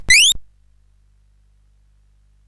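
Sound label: Moon Patrol, MOS 8580 SID chip music, games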